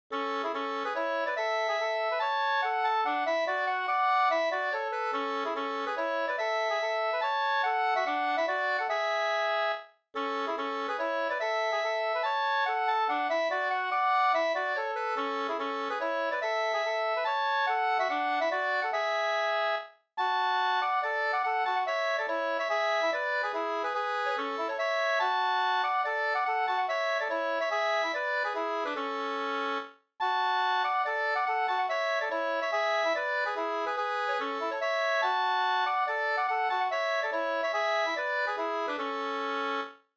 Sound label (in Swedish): polska, 2st